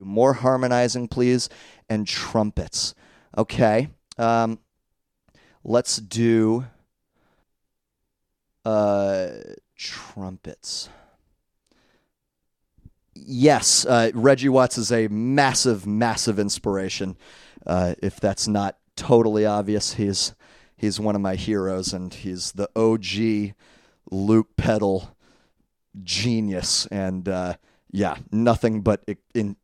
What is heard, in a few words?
Trumpets